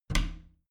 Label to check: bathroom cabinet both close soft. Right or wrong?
Right